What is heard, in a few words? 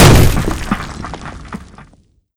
brick hit; tf2classic